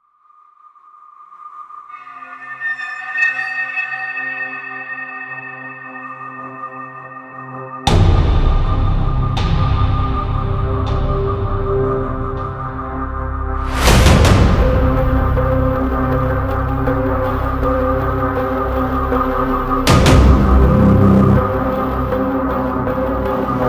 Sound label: Strike; Ninja Tracks